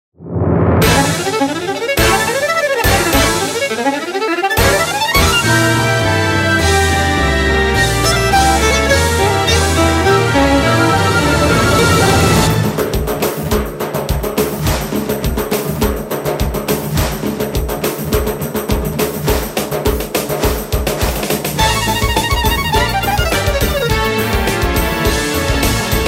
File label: Thunder